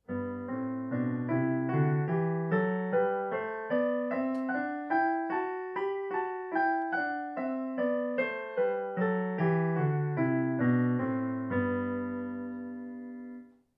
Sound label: Parallel motion in thirds or tenths